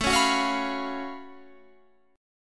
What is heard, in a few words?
F sharp Minor Major 11th A